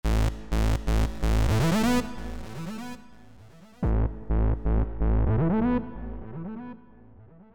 Lead; PatchFactory

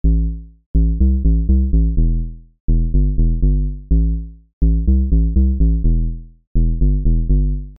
Bass; PatchFactory

